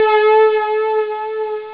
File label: synth; da capo vol1; instruments